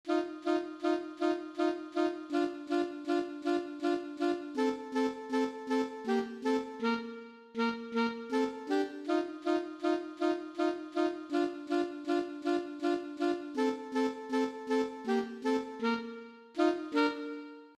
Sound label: Chopsticks, 2 Alto Saxophones